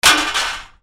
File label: FALLING